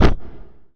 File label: fire burst flash; SupaLidlGame